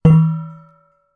industrial metal post driver impact light resonate; zapsplat